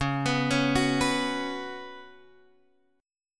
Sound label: Db 7th Suspended 4th Db down Guitar Open G